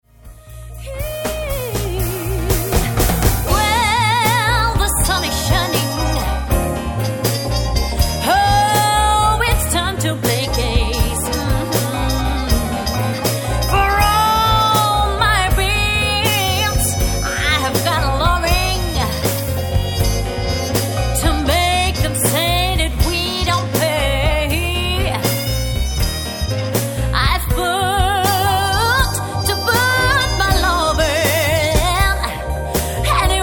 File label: Blues